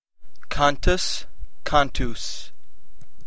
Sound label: cantus